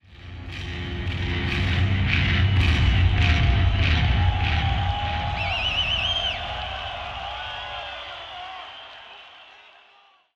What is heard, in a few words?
Bass Solo